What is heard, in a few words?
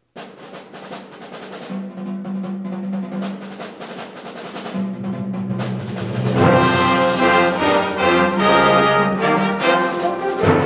Triumphant Fanfare, concert band; Richard Saucedo